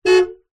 vehicles car hyundai trajet horn beep single internal; 2006